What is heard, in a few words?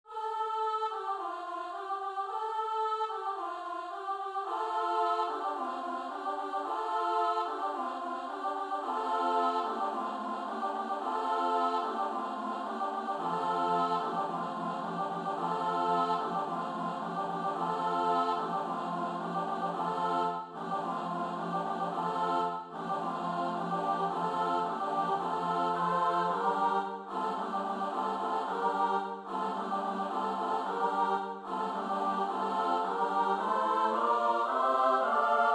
S A; Christmas Carols